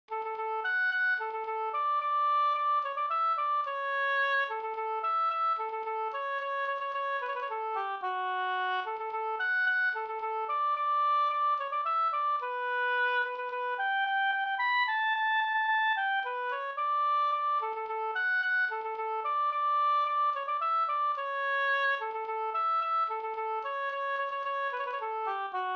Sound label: polka; e Hallonlov Sk